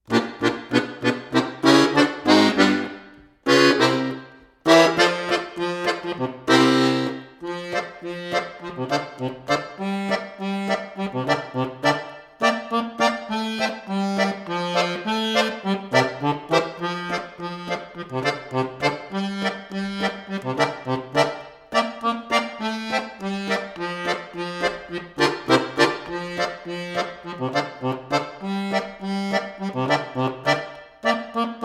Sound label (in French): instrumentale